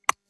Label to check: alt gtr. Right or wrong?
Wrong